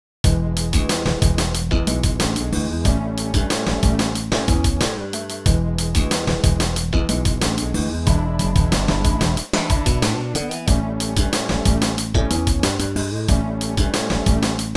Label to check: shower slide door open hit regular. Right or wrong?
Wrong